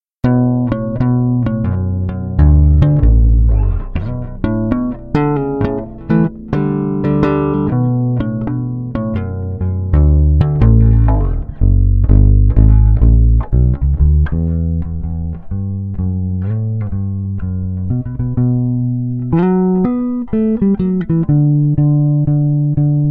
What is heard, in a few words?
bass sound sample neck, bass guitar; sanjuro